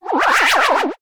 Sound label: Wibble, Synth FX, cartoon; musicradar; cartoon caper